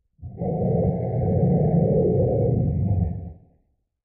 Small ambient fog; STALZONE

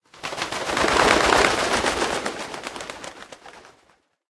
wings, ambient; STALZONE

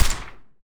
psg1 silencer; STALZONE

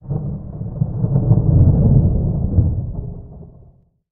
Small ambient pre storm; STALZONE

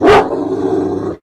dog attack; STALZONE

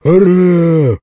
zombie attack; STALZONE; customnpcs; stalker

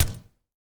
glock silencer; STALZONE

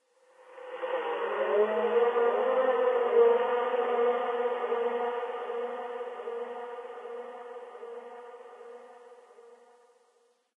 strange sound; STALZONE; minecraft

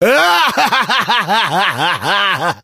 bandit smeh; STALZONE; minecraft

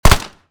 PPSh fire; STALZONE

